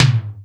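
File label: Tom Lo Blade, Alesis D4 drum machine; 300 Drum Machines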